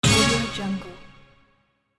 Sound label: quiz show hit